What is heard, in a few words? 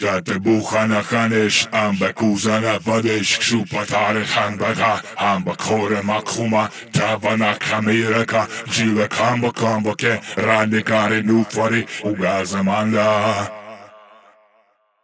138 Shamanic Voice (Wet), Psytrance